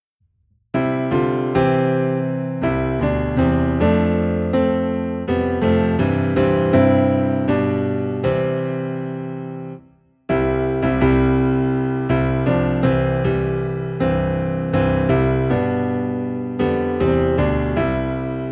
Piano Low in C; All the Way My Savior Lead Me

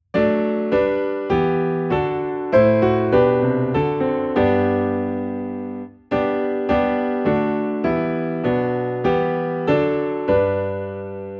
Piano in G

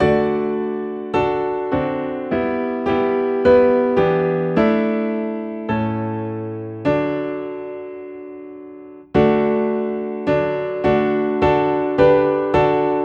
Piano in D

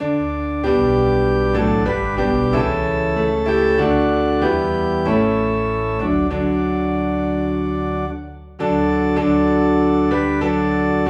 Piano and Organ in D; We Gather Together

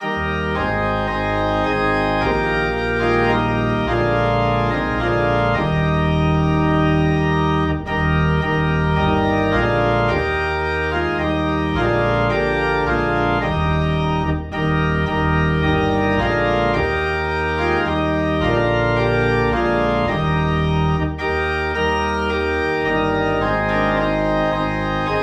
Organ Low in D Flat; Whiter Than Snow

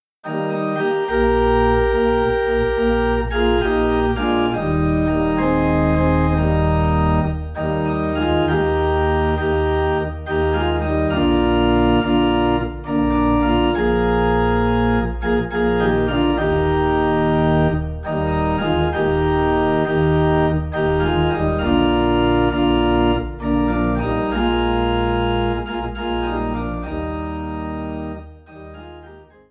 Organ Low in E Flat; I Will Sing of My Redeemer

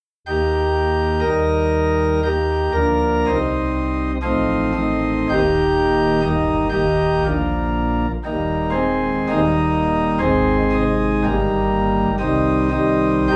Organ Low in E Flat; How Firm a Foundation

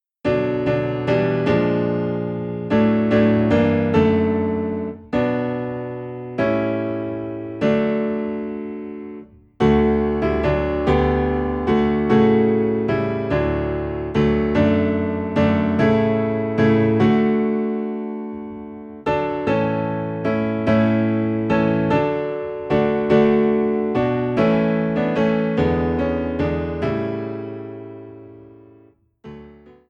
Piano Low in D; Jesus Is All the World to Me

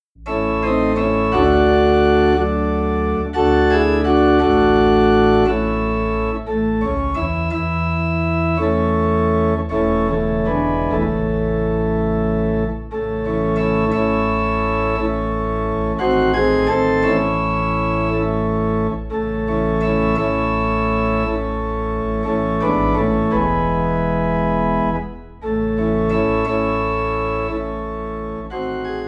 Organ Low in A

